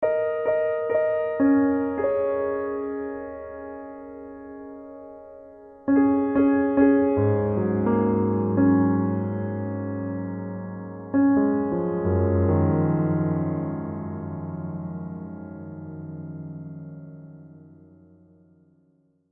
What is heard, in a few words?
Piano; Beds